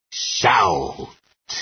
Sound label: shout